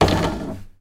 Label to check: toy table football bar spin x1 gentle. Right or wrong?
Right